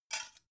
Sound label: dough hook drop